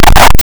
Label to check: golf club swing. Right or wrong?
Right